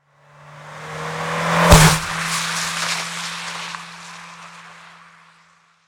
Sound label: Car Fiesta Pass By Wet; noise creations